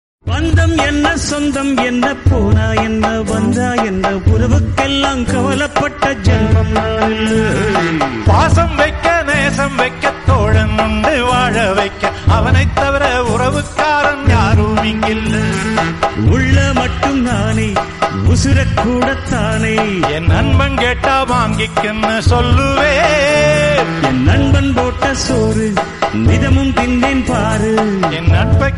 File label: songs